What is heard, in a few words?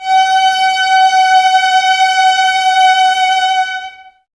VLS G 5, Violas; Miroslav Vitous; VLS 1+2